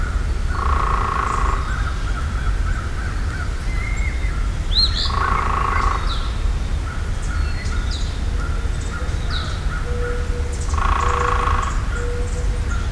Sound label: Woodpecker, birdcall